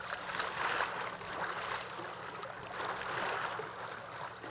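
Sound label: water loop; Splashdown; XBOX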